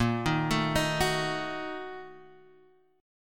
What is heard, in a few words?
A sharp Major 7th Suspended 4th Sharp 5th, Guitar Open F; arpeggiated; JGuitar